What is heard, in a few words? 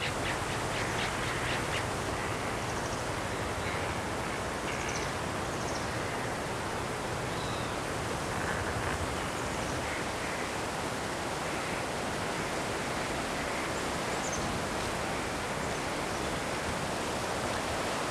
beach; RYL 2 v1673; Private RYL